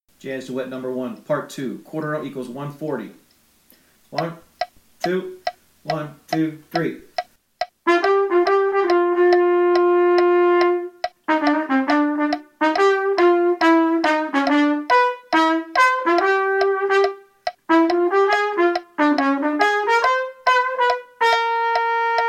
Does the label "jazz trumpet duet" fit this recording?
Yes